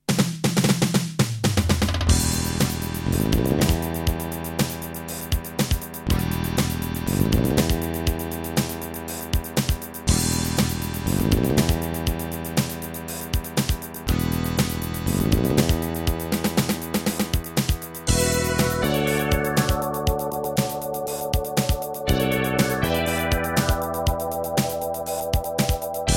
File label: Terratec WaveSystem SCW001-2; Dune II